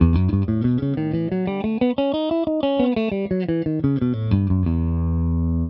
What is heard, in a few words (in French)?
Mi mineur melodique, Guitare; Exemple audio " Mi mineur mélodique "